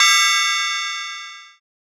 ride dark modal

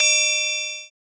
cymbal short fm, trap

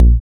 kick tight beater, trap

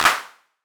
clap wide, trap

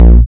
kick crunch beater, boom bap; boom bap modern

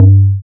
log g, amapiano log drums

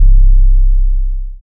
808 c soft

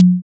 perc dark, drill; drill toolkit

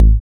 kick beater; dembow latino

